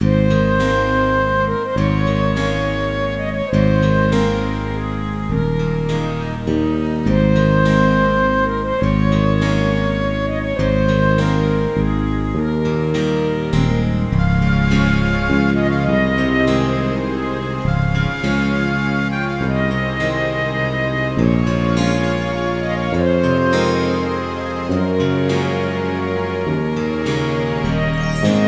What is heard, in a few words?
valse; Microsoft MultiMedia Pack for Windows